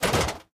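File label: inventory items weapon big; STALKER; IWP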